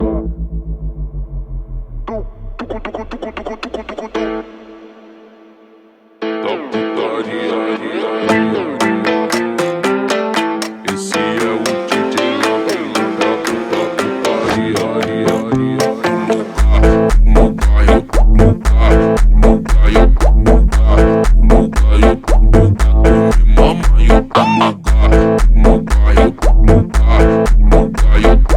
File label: saxofunk slowed